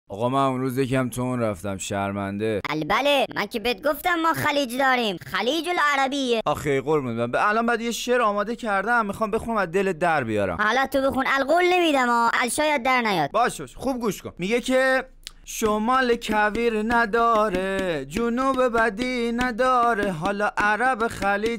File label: Gulf Persian